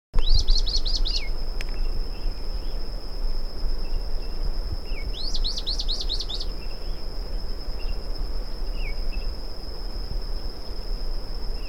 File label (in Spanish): Mosqueta Estriada, Myiophobus fasciatus, Bran-colored Flycatcher; cantos